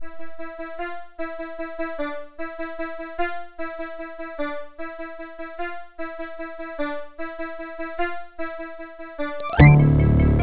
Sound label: pc waltz, opl; doom